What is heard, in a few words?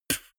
beatbox bass drum dull damped